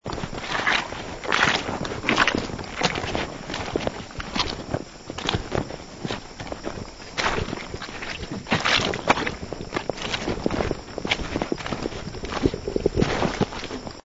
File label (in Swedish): lerklafs; dag 4